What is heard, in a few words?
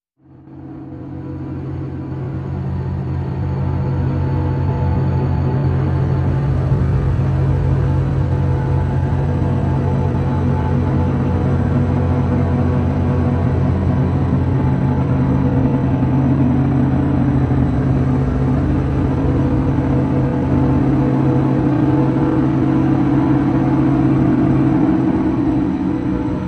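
Drn B, drone; Fathomage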